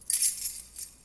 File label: Key Jingle